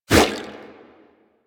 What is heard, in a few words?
Sword Swipe, Whooshes